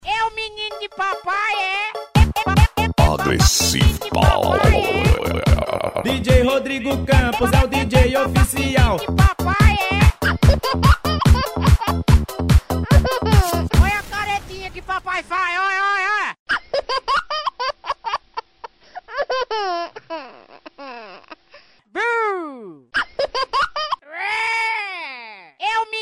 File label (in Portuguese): Baterias, som automotivo; Baterias Agressive Power; som automotivo raiz; Open Format